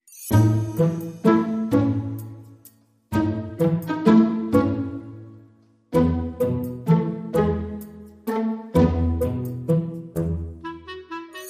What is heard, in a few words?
Traditional Percussion